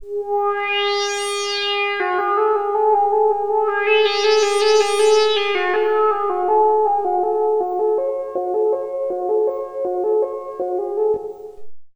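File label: synth, Drum & Bass; Best Service ProSamples vol.03 - Drum & Bass 1; AKAI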